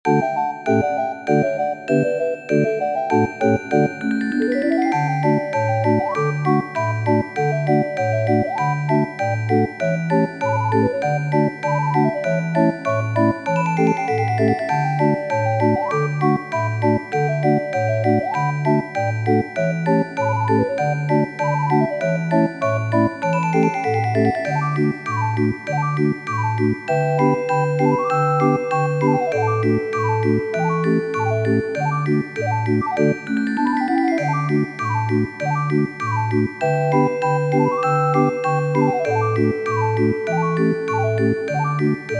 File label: bells